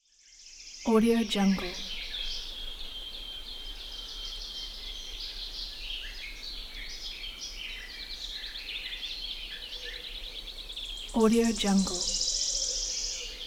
distant forest ambience

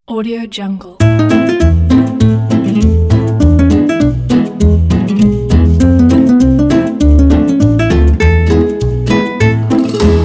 light comedy scoring; audiogong

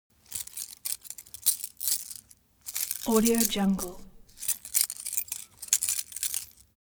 object metal small handling; smartsoundfx

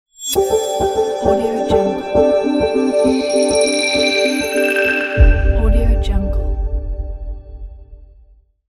futuristic opening logo

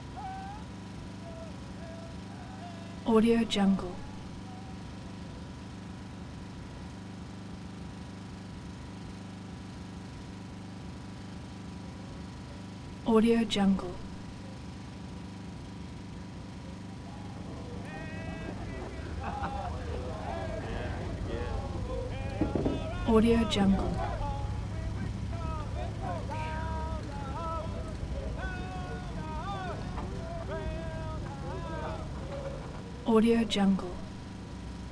army camp; prosoundfx

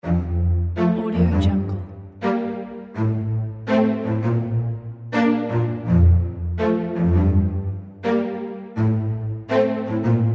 modern classic

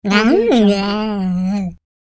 gross gremlin

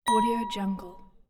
bells

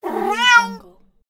cat meow

